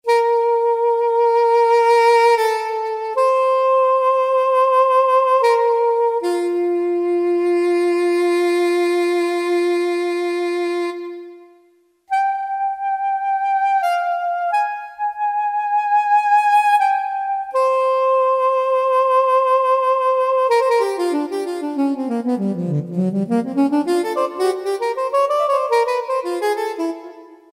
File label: Sweet Spr, Yamaha YPT-400; Voices